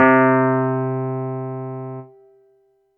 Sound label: yamaha ex5 wurli c4